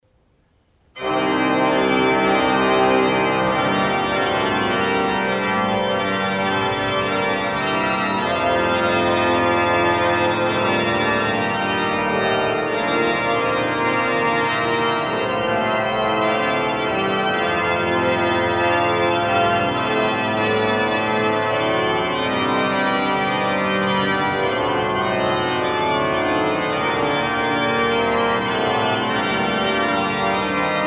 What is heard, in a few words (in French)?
plein chant, orgue; couperin